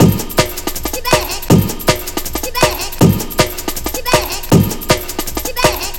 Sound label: 160, Drum Loops; Total Drum&Bass